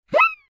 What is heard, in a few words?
Fast Throw; Bugs Bunny - Batter Up!